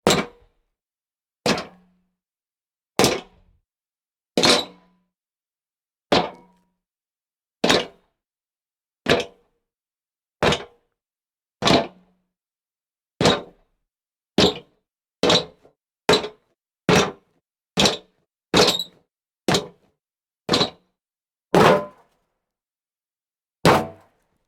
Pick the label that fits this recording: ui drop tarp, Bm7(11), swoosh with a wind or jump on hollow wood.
jump on hollow wood